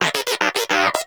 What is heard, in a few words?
Inquisitive Vocal Agree; Pets; Warframe; Moa